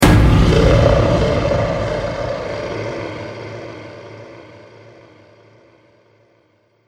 Demonic Voice Impact, Monster Sound Effects

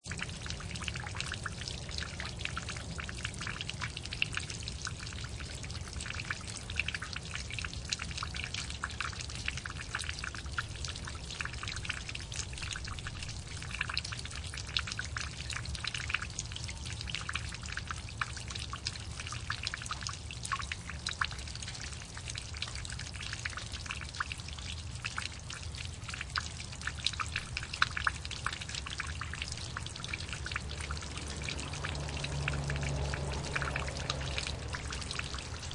Sewer, running water; Backgrounds

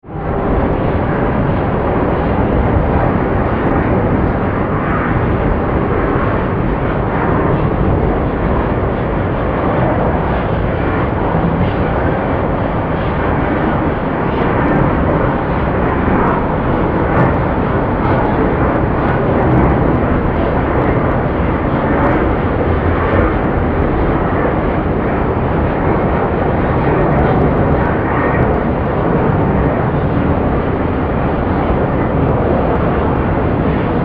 Drone, Demonic, Vortex, Voices, Horror; 5.1

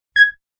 Bleep; Beeps

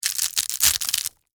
Crunch Ramen Noodles; Texture; Crunch Sound Effects; Objects; Blast Wave

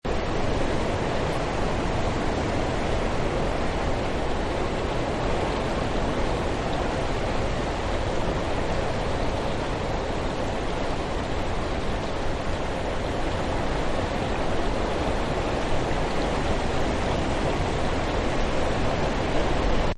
Heavy Wind Light Rain; Sound Effects:Weather

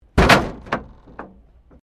Wreck Door Panel Clunk Metal

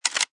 Machine Gun Foley Chamber Closed; Machine gun, type 2: Chamber closed; Gun Handling

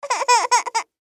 Cry Whine Short Male Toddler; Crying; Human; Blastwave

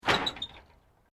Metal Corrugated Iron Wind Movement

